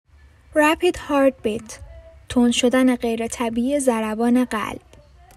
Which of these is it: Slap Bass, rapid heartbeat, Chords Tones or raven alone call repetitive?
rapid heartbeat